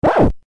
UI CHANGE BET; games; Columbus

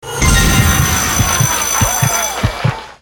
bigwin; Merry Fruits; slot

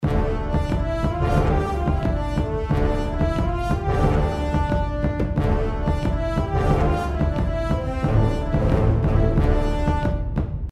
intro bgm; Ares God Of War

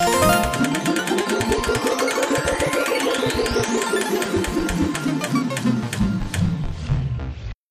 wheel spin; Hot Spin Deluxe

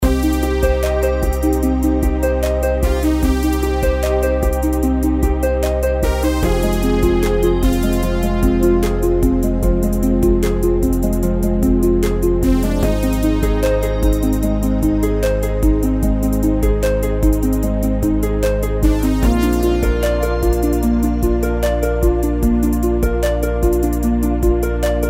reel spin, horoscope

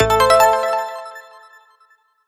oneline win; Magic Stars 5; Wazdan

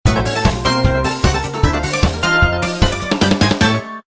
WIN MAX; Fruit Sensation

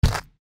reelspin click; Snow Leopards

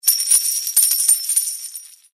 coin; Lotus Lamp; slot game

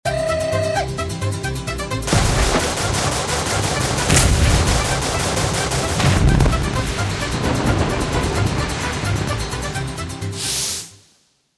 bigwin mega; Fruit Fiesta; Wazdan

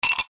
reelspin click; Stocked Bar

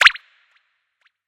bonus symbol scale on divide; Hungry Shark; Wazdan

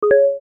hit, keno; Bingo

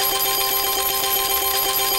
collect; Hottest Fruits 20

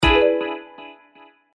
hit; Muscle Cars

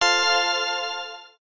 autoplay on; Lucky Joker 5

